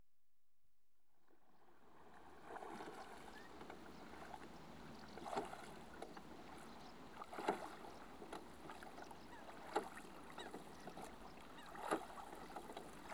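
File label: Rowing Boat - 1 MAN Rowing, Approach; Ships And Boats; BBC Sound Effects Library; Ships And Boats 2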